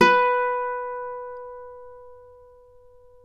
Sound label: Celtic Harp, Plucked Strings